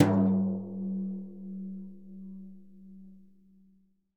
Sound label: Synclavier Percussion, World & Orchestral; Synclavier Percussion 2; ILIO